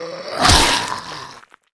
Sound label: Leopard skill; Seer; GamigoNA